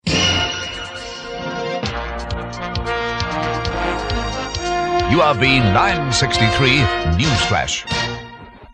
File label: newsflash, lifting bed